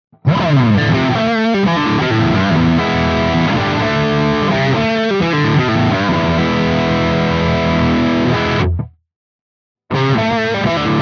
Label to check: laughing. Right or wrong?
Wrong